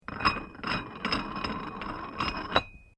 Weights Dumbell Loosen Screw, Gym weight lifting equipment; Sports